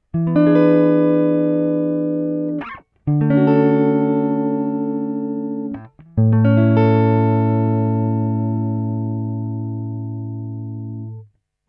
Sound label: riff clean raw